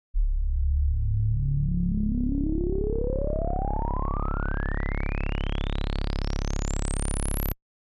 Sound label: Ladder Reso; synthmaster